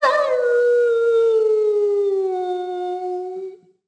howl; Rust

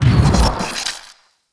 mohole discharge

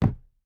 footstep rubber boots wood jump land; Rust

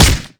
punch human

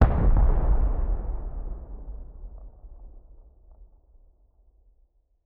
rocket explosion distant; Rust

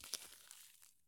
soil walk; Escape from Tarkov

